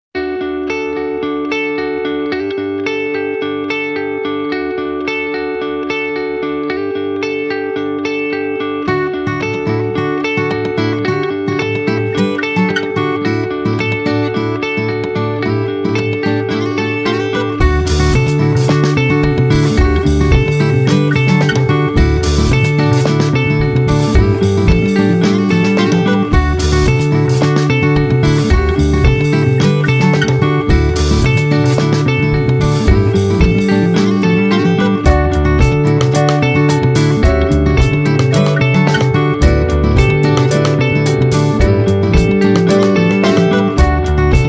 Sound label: Medium Playful Positive